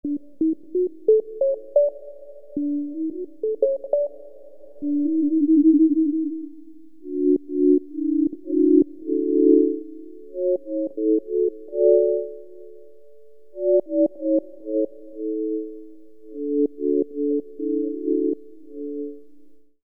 sine test; cyberwhistle

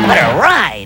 eek

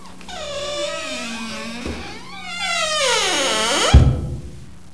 scary door; Halloween

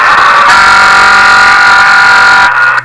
horn; bentonfd

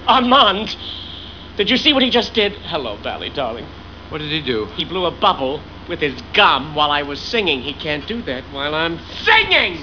SINGING; The Birdcage

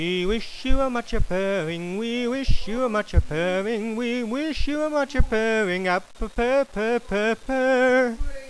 purr

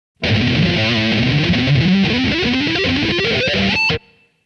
Three note per string Alternate Picking; Mick Thomson

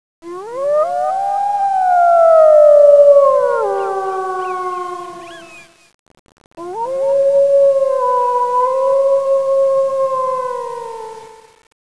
solo wolf

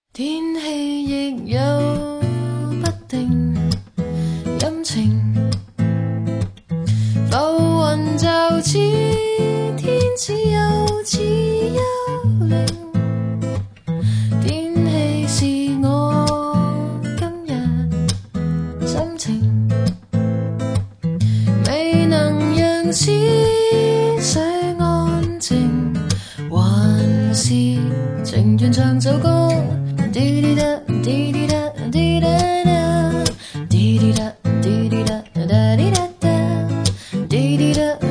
singing

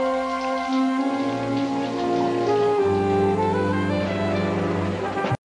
shower

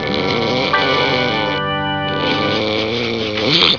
SNORE; [George snoring]; George And Martha

